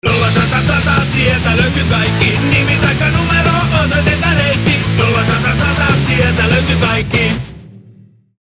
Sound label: Jingle; mainosmusiikki